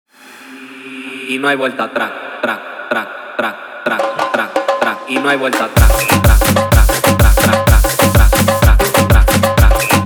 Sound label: afro remix 126 bpm